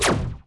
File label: shot; ZombieBattle